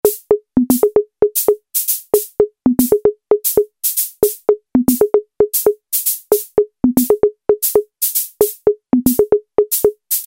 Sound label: Bongo Beat; Alesis airSynth